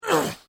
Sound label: Male Grunt Human Injury Pain Vocal Ugh Ouch